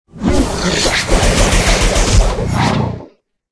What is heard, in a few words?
guardian attack, monster